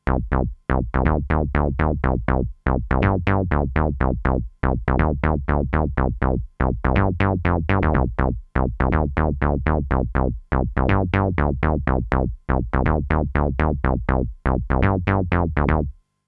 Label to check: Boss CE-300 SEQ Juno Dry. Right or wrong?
Right